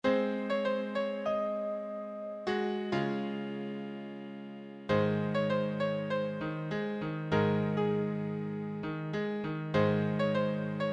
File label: solo, piano solo